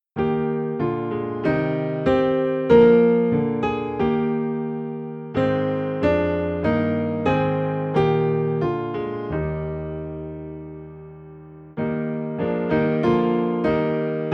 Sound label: For The Beauty Of The Earth Piano Low in E Flat